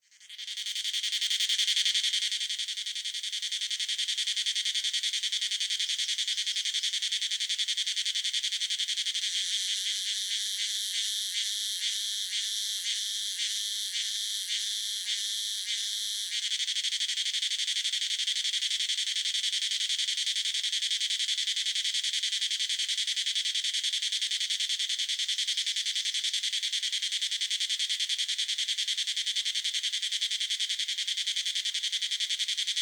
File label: ambient cricket; STALKER